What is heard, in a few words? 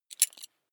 metal belt buckle clatter heavy